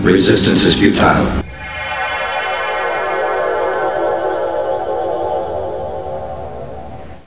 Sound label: resist beam